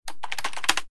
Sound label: keyboard; trash collection